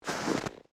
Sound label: Snow Single Slow, Footsteps; BlastWave